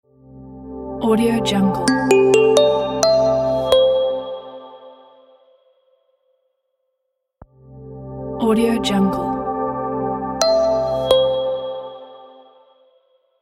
short hi tech logo

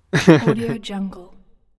male laughing

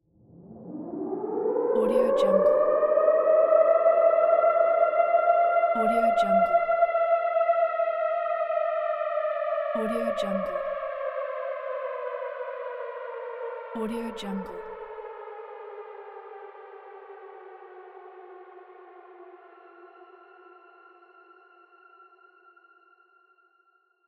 emergency siren

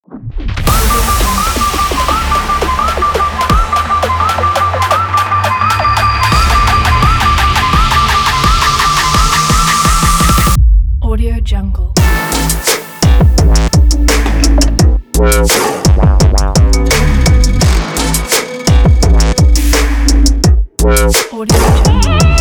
dubstep

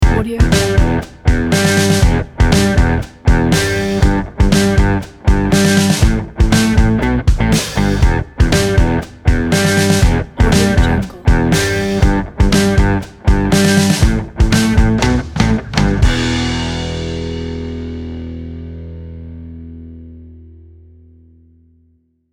rock logo; dailywaves

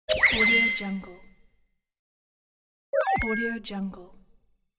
futuristic game level up

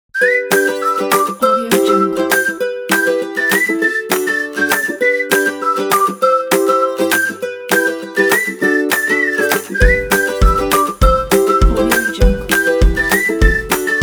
happy; rainbowsounds

